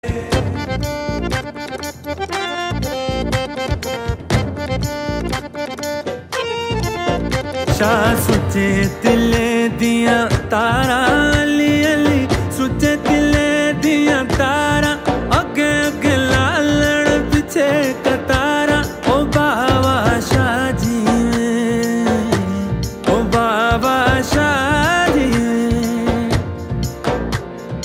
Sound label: instrumental music ringtone